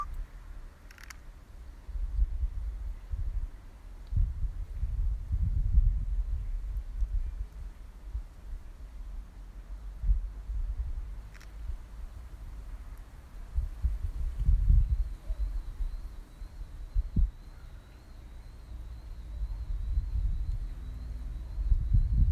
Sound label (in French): test alarme